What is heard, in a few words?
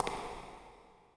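echo walk, footsteps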